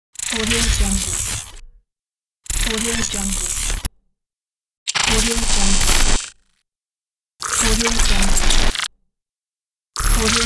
glitch sfx sound effect

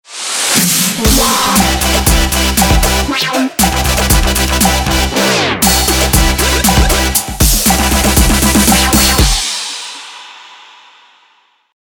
future bass ident

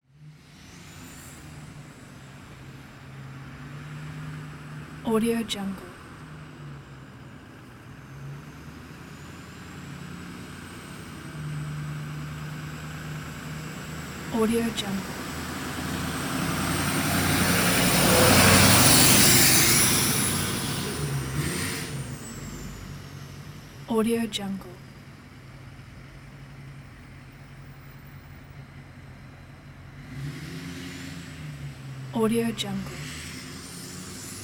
diesel pickup truck drive by; applehillstudios

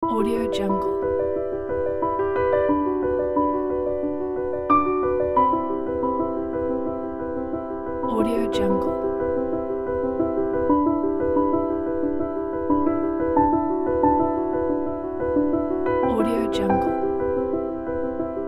arpeggios and ink drops; alkis

